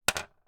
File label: ball draw, bingo